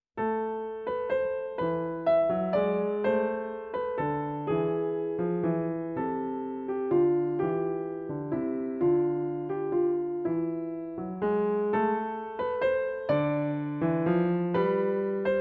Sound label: Invention in A Minor; Frederick Silvester; Celebrate Theory recordings